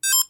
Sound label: T1000E Toggle Sound On; SenseCAP T1000-E; meshcore companion